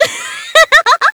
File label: laughter; svencoop; player sounds; lappland